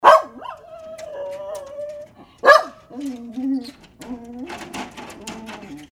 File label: dog barking